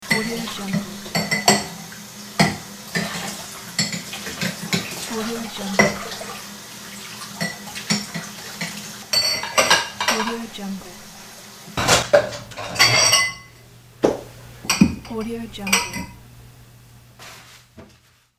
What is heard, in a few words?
kitchen ambience; audiocellstudio